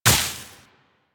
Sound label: Tenno Step Veg, Footsteps, Walk; Warframe